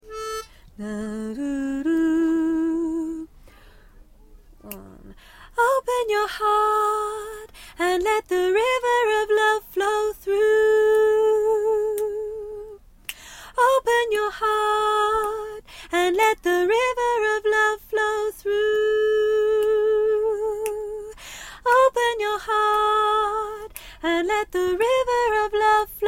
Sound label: (soprano); Choir